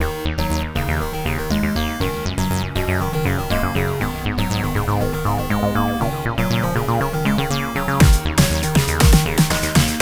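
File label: racing techno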